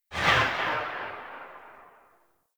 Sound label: Tail Ext, Weapons; Supra Vandal